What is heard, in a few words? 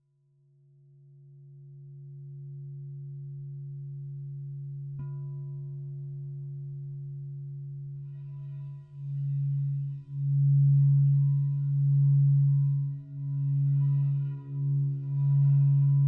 crybowl